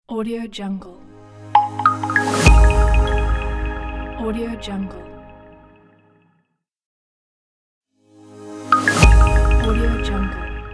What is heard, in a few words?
welcome logo